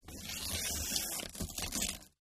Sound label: paper tear